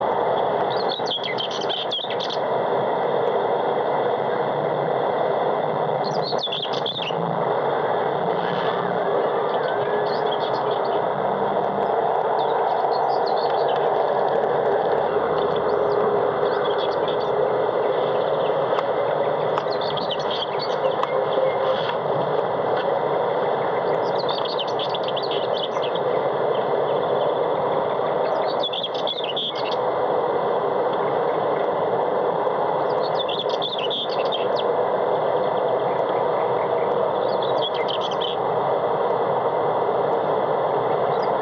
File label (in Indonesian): whitethroat varian